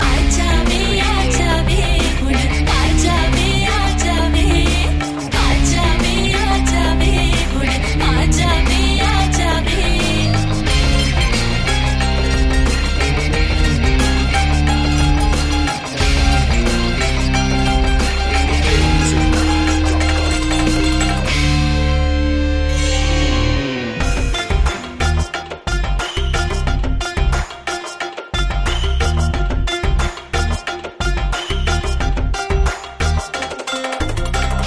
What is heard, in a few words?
the magic sitar loop